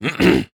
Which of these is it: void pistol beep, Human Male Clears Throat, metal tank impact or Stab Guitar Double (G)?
Human Male Clears Throat